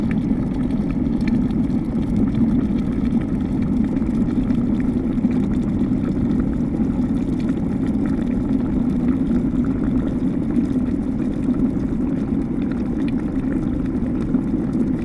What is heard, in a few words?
Boiling Water